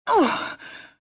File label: pain; mothma